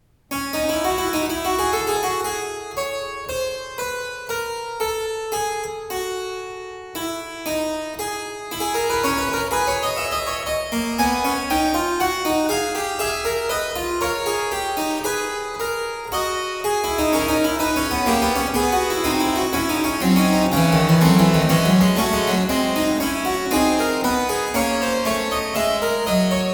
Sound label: Prelude and Fugue in D minor, Well Tempered Clavier; BWV 875; Johann Sebastian Bach